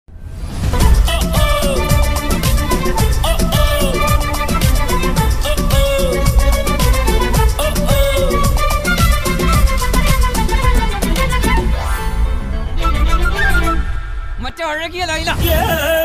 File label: song ringtone tamil song